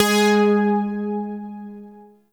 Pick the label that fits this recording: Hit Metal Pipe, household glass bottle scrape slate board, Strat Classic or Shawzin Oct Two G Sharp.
Shawzin Oct Two G Sharp